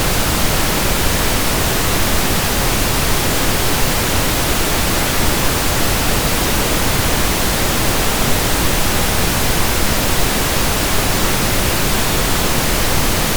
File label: Pink Noise